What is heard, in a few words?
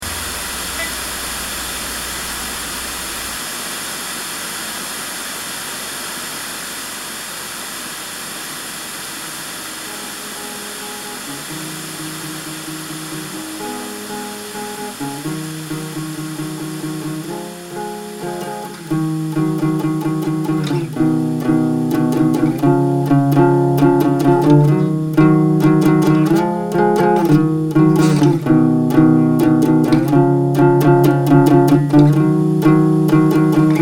salt shaker blues